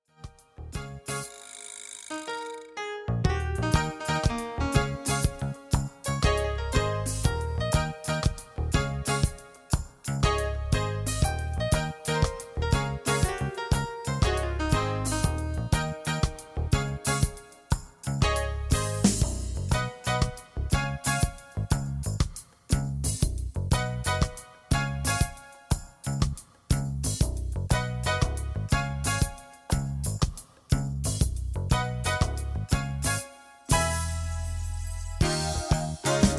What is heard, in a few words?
Reggae